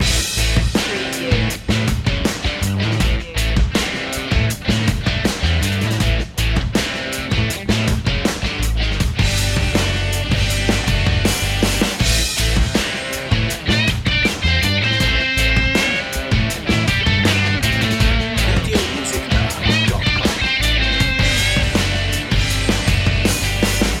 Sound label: truck power loop